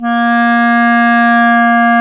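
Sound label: wind; Roland - LA Composer vol. 3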